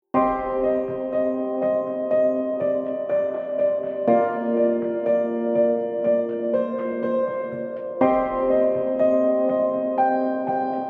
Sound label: Gloomy Day, drama sad reflective